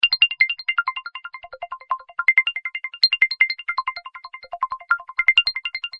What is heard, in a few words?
Bubbly, ringtones; Magic